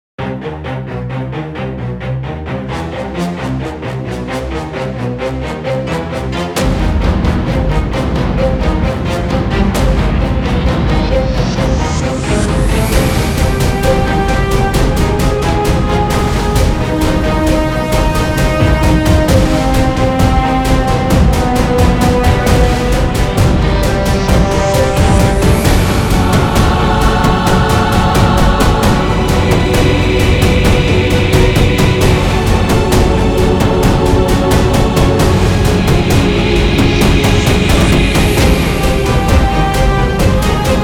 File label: Epic ostinato